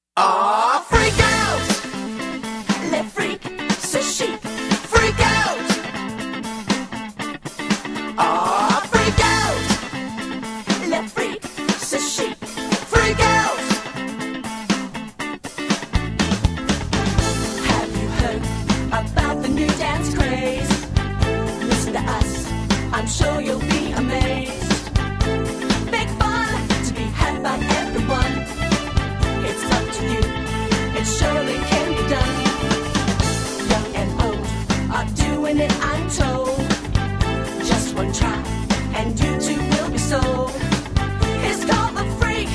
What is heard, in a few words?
cover band